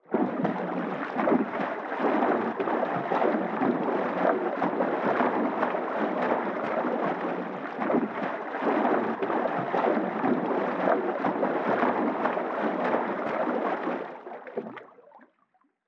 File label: Underwater Water Splash Swim Movement